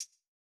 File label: Not Nice HiHats, Closed Hats